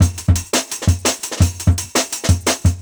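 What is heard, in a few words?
Jungle Breaks, 170 bpm; Resodance; Original Jungle Breaks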